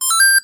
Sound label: T1000E Bootup Sound; SenseCAP T1000-E; meshcore companion